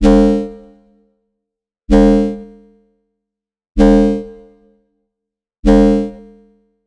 celestial curbstomp, one shot; cosmic

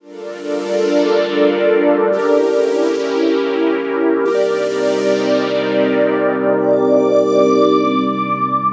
Pad Classy; hera

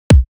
bouncer kick; breakout

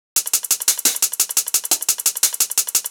loop fresh 174 tops; breakout

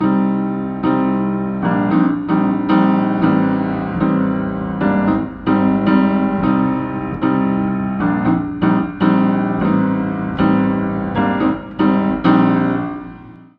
Piano Loop 150 C; embers; Bentra

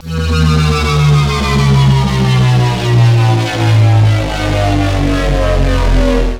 whoosh powering down; bossfight

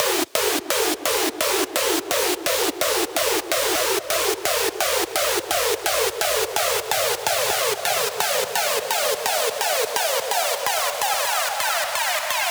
laser riser 128 8 bars; paragon

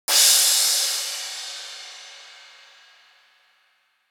crash; horizon

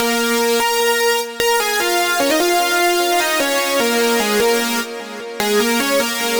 drop lead Bb 150; radiance; Questsolve

